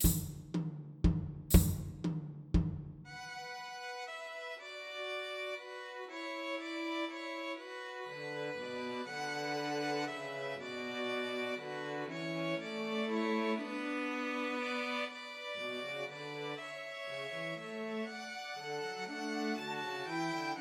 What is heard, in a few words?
Christmas Trios for Two Violins and Cello; We Three Kings